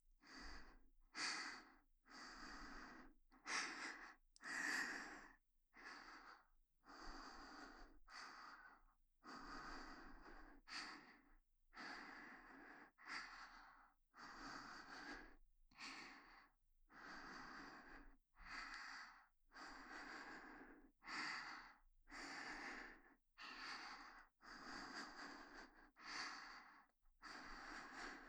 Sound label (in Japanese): 吐息